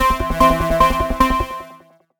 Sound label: Neptunium, alarms; Motorola Droid Razr M